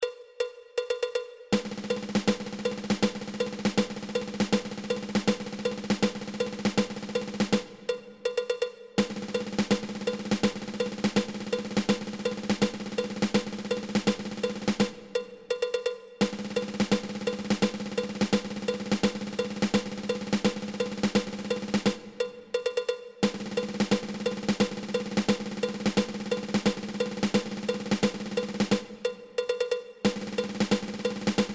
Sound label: Ten Stroke Roll